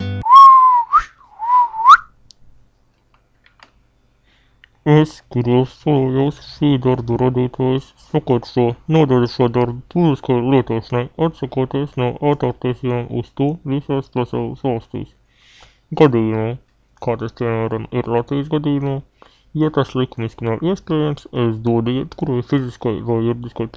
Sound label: carulli duet in g